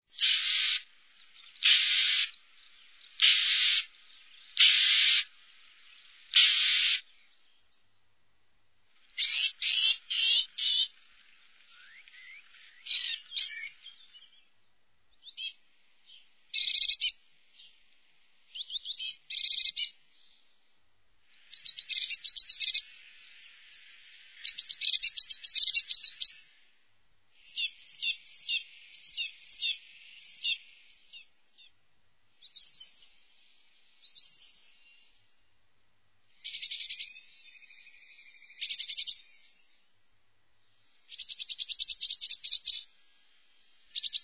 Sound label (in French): mesange bleue, chants et cris